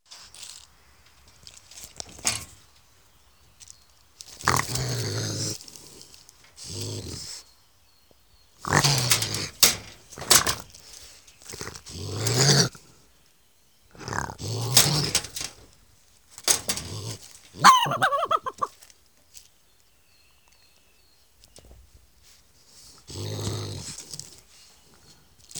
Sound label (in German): Waschbärlaute; Die Urheimat des Waschbären liegt in Nordamerika.